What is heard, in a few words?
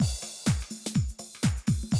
125, Classic Drum Loops